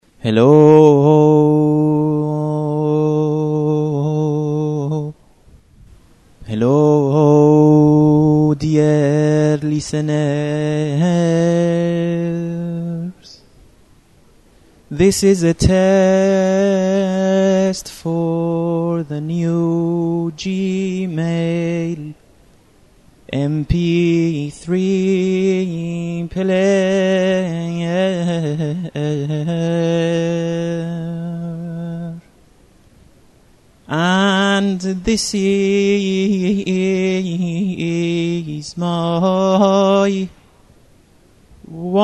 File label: singing test